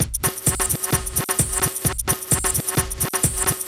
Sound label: PHATT BEA; E-MU Formula 4000 Series Vol. 1; Hip Hop Nation